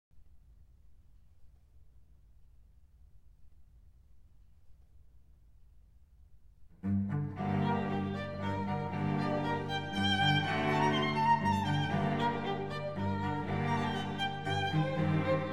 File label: String Quartet in E Flat Major Scherzo allegretto moderato